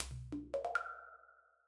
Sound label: Textures Bubbles L; Impulse Responses; Ableton Live 12 Lite; Hybrid